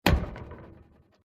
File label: Icebox 1940s Door Close; Various old or antique devices; Appliances; Household